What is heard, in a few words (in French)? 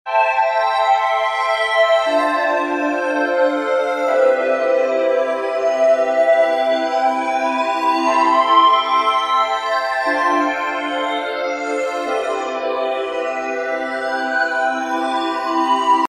nappes flanger; FX Story le flanger